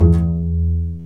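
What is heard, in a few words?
String, contrabass pizzicato; String Master Series; Cb Pizzicato; Cb Pizz 3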